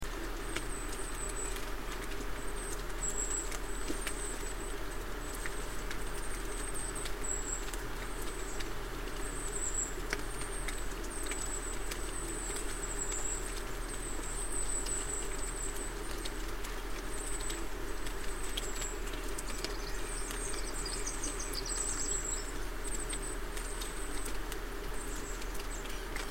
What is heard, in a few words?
high calls